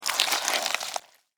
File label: Spider Bite Fang, Spider, Bite, Fangs, Arachnid, Insect, Bug; Spiders; Sound Effects:Animals:Insects; Blastwave